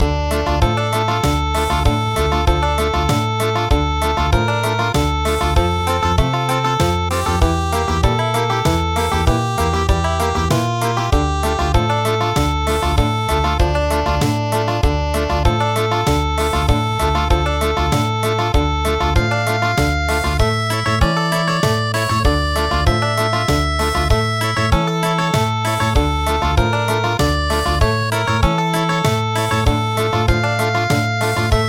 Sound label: Country